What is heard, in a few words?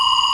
laser